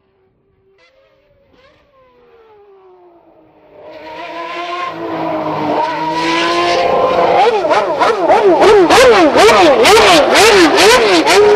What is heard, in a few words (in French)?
Bruitage Moteur De Formule 1 - f1 v12; Ferrari